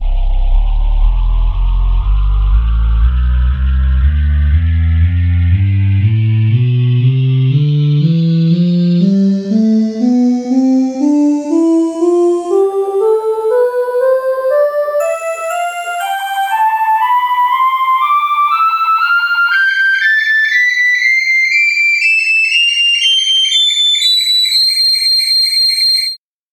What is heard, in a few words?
space voice tonleiter